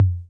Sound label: Tom Low C 808 Tape, Clean